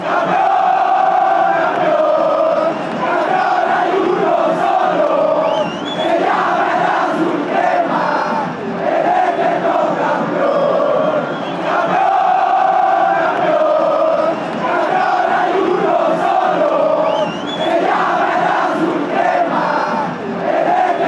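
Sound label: campeon hay uno solo, football chants; En esta canción, el Ritual del Caos, que alienta al Club América se refiere a los hinchas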